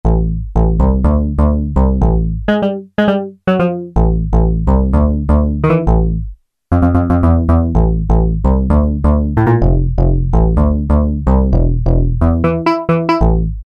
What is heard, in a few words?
PPG+ANLG BASS, Akai SG01v